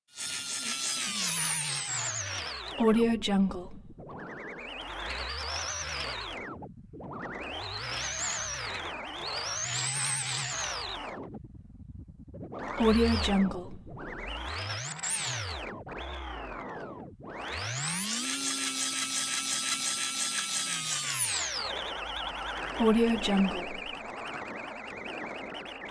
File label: old electric motor wobbly drunken spin